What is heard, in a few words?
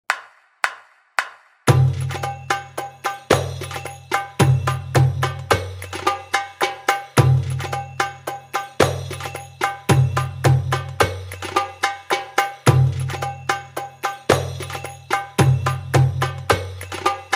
samai taquil, ritmes